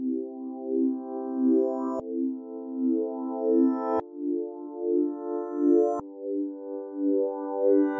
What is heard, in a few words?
chord rev